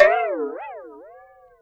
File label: Dazed, Classic SFX, cartoon; musicradar; cartoon caper